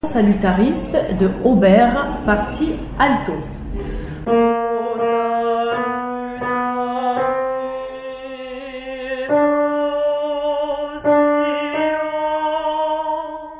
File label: Alto; O Salutaris; Auber